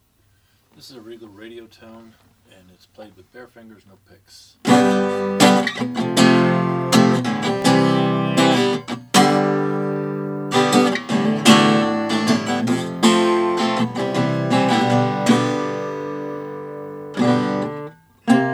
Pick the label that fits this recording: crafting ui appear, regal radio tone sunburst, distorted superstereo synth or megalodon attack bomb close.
regal radio tone sunburst